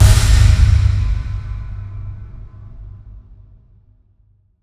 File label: jump scare; halloween